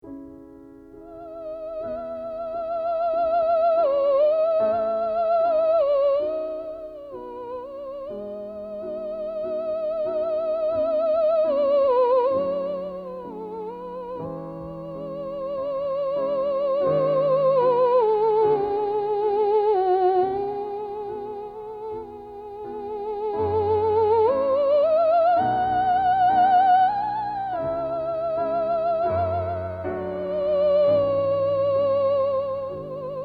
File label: Vocalise